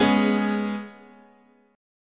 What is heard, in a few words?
G sharp Minor Double Flat 5th, chord